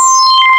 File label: WV SWEEP; AKAI S6000 CD-ROM - Volume 1; SAMPLE WAVE MIXING 1; URBAN SYN